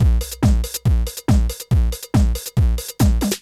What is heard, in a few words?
techno 140